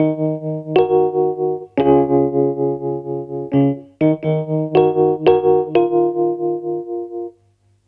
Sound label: RHOD R, Roots Disco; USB Soundscan vol.32; AKAI